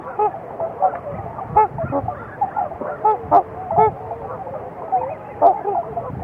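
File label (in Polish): trębacz, łabędź trąbiący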